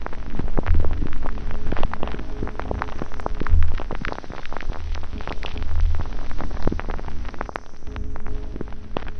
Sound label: loop, techno atmos